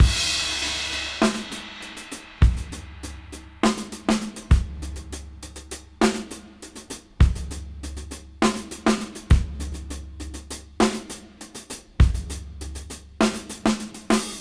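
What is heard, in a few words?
Half Funk 101 BPM Reverse; AMG; Keith LeBlanc Phat Butt Jams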